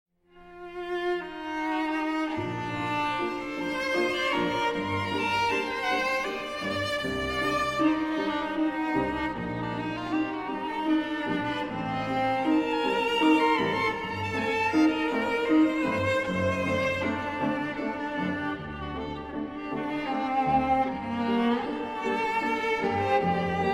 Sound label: piano quartet; schumann